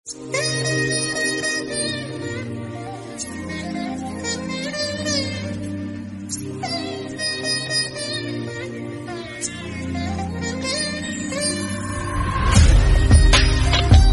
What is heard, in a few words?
Veena Mix, BGM